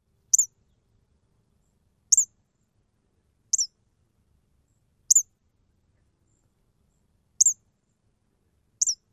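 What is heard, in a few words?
Eastern Kingbird ringing k'tee call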